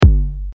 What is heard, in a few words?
Kick Master Slave Sidechain EQ